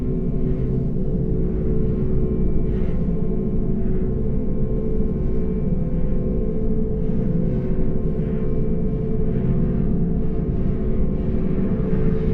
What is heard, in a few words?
atmos